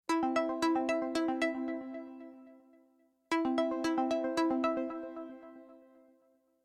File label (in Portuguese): alarme